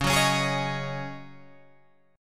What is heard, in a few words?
Db Suspended 2nd Suspended 4th Db Guitar Open G